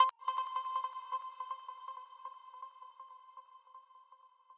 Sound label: arp